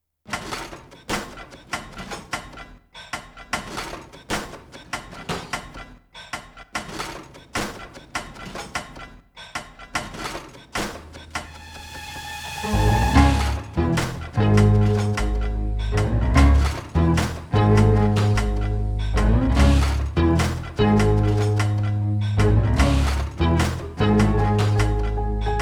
This track has has a very mild latin flavour to it.; It uses percussive sounds from sampled kitchen sounds